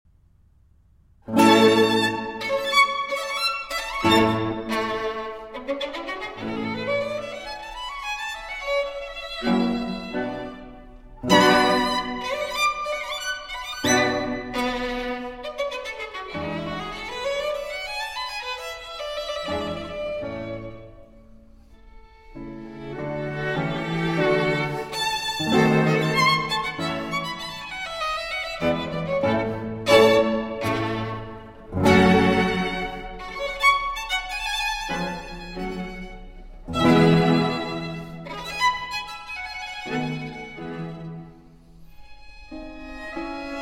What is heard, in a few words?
Quartet in A Major, Allegro Maestoso